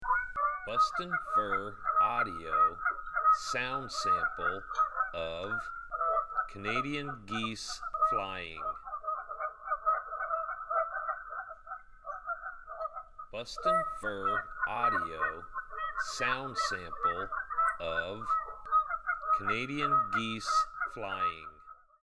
Canadian Geese Flying

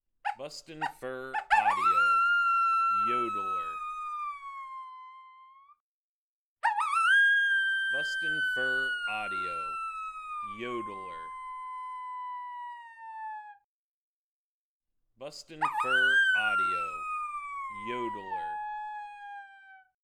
Yodeler

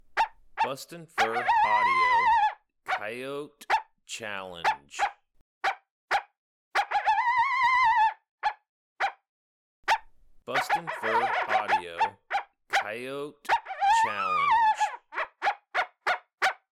Coyote Challenge

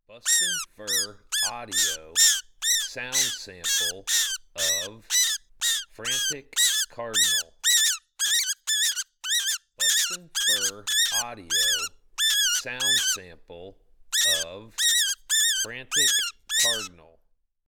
Frantic Cardinal

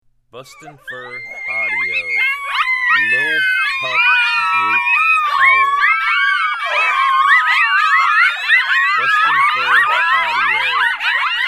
Lil Pup Group Howl

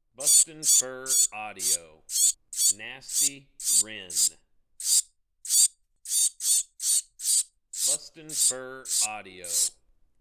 Nasty Wren

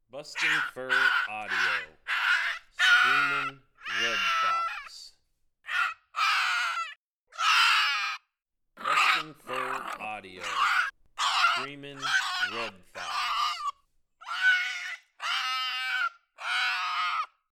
Screamin Red Fox

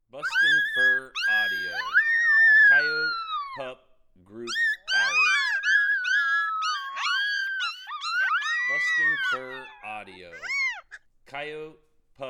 Coyote Pup Group Howl

